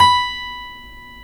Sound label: folk steel, guitars